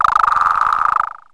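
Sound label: medium vibration, cartoon; Wired for Sound Pro CD; Aristosoft, Inc.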